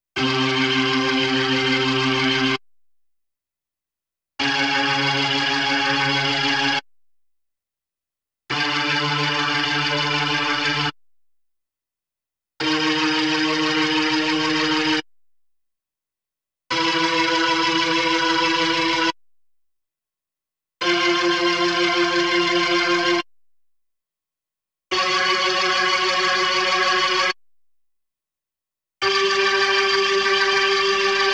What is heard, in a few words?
B3 Organ; Masterbits CLIMAX 8